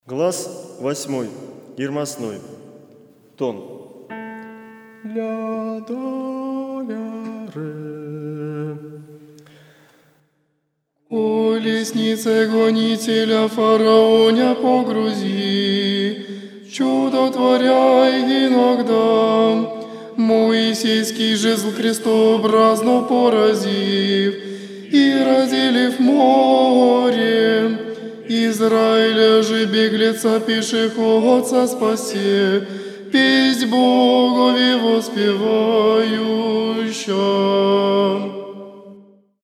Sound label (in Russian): Muzhskoj hor Glas 8 IRMOSNOJ vtoroj tenor